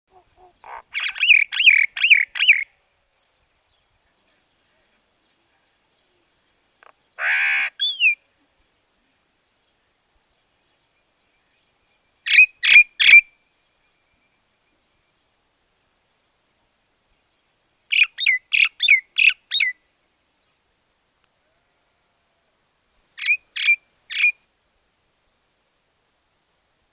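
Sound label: common myna voice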